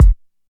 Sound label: boom bass drum one shot f sharp key; kick drum; kick drum samples